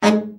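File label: baritone, BARITON SAX; Best Service; Brass Super Section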